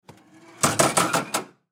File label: Jukebox Antique Open Glass Case; Electronics; Technology; Noise; Blastwave